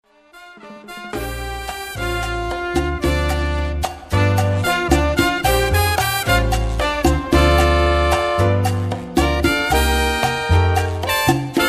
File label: Latin Jazz; Putumayo World Music